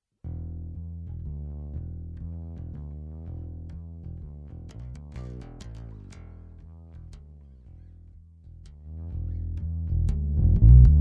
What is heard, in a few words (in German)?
Great River Harrison32 Bass Mono EQ Alle 4 Bänder Cut Und Boost